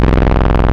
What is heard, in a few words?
Mod M15 Saw Pulse, wavetables; Animoog